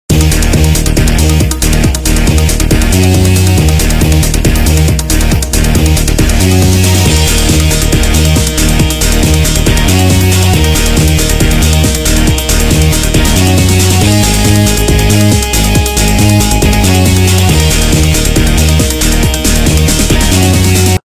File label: Warning Zone; Kyocera; DuraXV-LTE